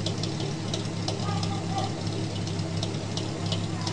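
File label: 900 RPM, engine idle; AssaultCube; Alien Stars; American Muscle Series; SkyEngine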